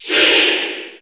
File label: Cheer English; Sheik; SSBU